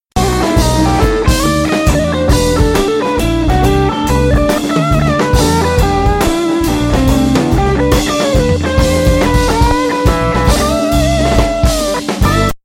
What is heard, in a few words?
Groove